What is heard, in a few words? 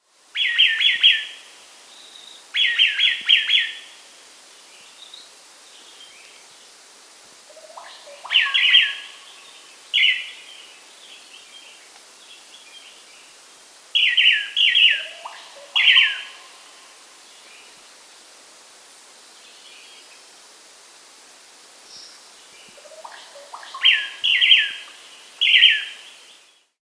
Yungas Manakin (Chiroxiphia Boliviana), Andean Birds; S. America